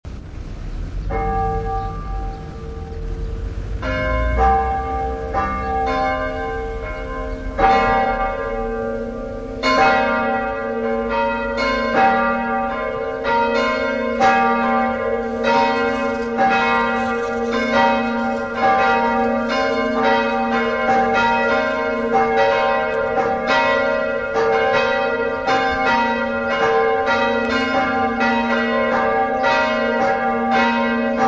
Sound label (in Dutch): driegelui, luidklokken; sintfranciscuskerk; klokken en uurwerk